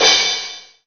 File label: Crash, Cymbals; Vengeance Samples